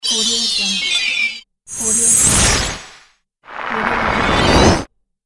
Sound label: freezing; blinnaudio